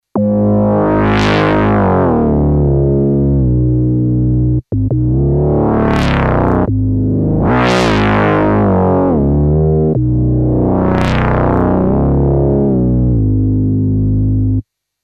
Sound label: Casio CZ101 sweepbass